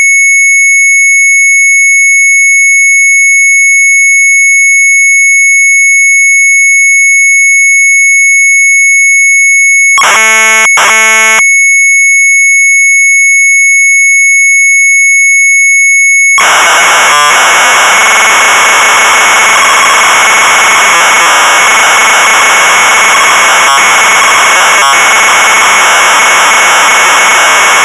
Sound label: MZ800 Tape; sharp; GON.GRAFIEK; retromelsarchive